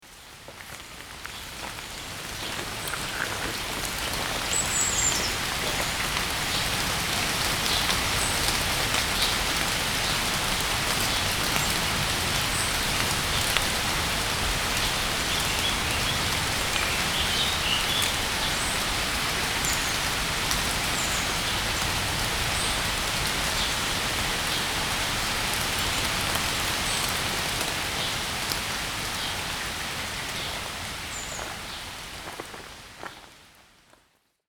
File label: Birds and Water Roncesvalles